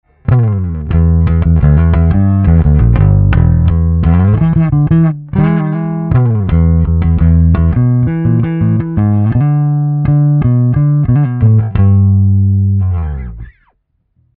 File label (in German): Mittenboost Bass DI